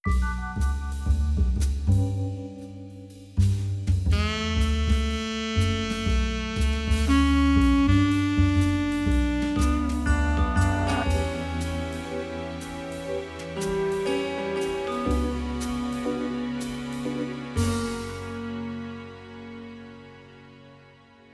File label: Sting